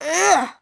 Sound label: Get Pebble; Finding Nemo; XBOX